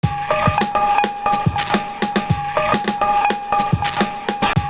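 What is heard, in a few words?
BOSSCRATCH, 106 bpm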